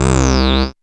Dance Synths, Acid Bubble, c

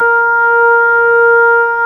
ORG B3 A#4; Best Service Gigapack 2

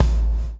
BLOW; Spectrasonics - Backbeat

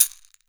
CAXIXI, Brazil Drum Perc; E-MU Producer Series Vol. 7 – Old World Instruments

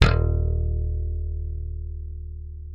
D#1, slap thumb; Xsample VOL-1

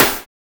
YACK SNARE; Drums In Your FACE!; DNC SNRS4

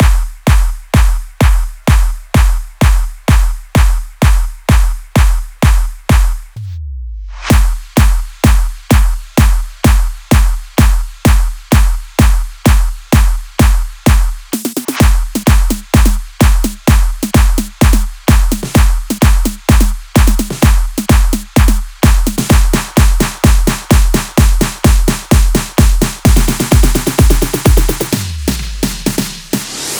Drum Loop 128BPM FULL A#

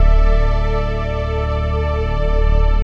D, Drum & Bass Pads; Total Drum&Bass; 90 s Sample CDs

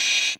Cymbal Hi Speaker, Stylophone Beatbox

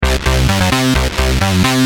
Bass, Industrial

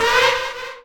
Dance Mega Synth; Best Service

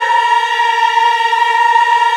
A#4, Choir Acoustic & Synth; USB Soundscan vol.28; AKAI

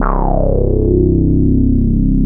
DD SYN, Disco House

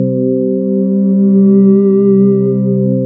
SYN K-2000; Sound & Vision - Gigapack I CD 2

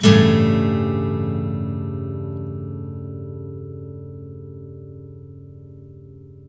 BARI CHORDS, Blues Guitar; ILIO; Fretworks

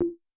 Perc Reso, Vermona Mono15 Drums; Stereo layers

